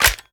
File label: reload empty mag in, reloads; gmod